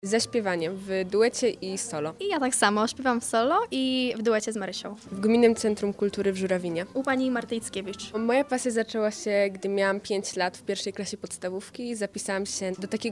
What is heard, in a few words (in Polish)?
wokal duet; mlodzi z pasja gala stypendialna powiatu wroclawskiego 2025